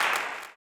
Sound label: CLAP, blues & soul; ILIO; Vocal Planet